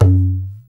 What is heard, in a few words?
ETHNIK LO, ETH. PERC; Drums In Your FACE!